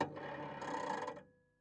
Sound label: PayPhone